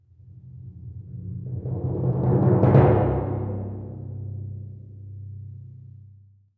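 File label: timpani roll crescendo c1; Percussion; Sonatina Symphonic Orchestra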